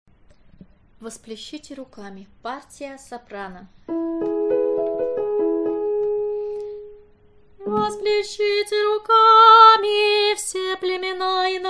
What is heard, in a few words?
soprano; Vospleshite rukami